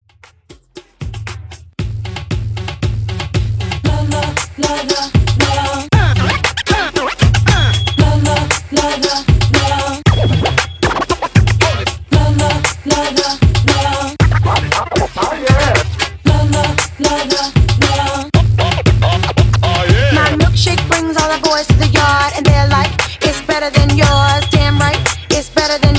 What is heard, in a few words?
Scratch Mixshow Extended 116 BPM